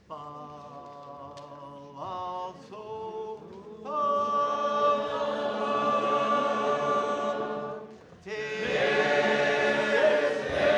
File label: Southwell, sacred harp